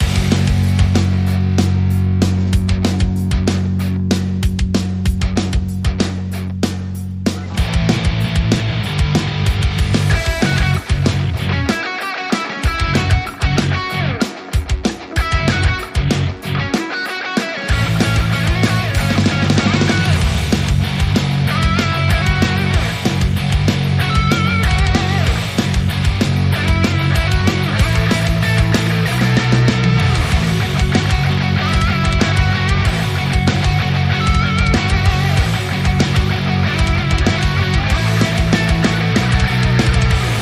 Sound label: Metal Speedway